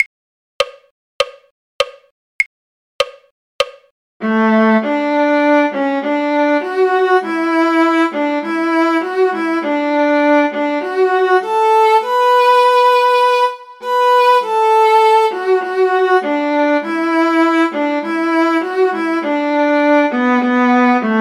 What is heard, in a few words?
viola; Auld Lang Syne; folk